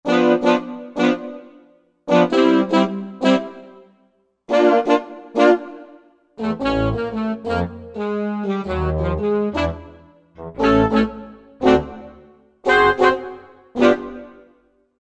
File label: Breath Horns, Korg Wavestation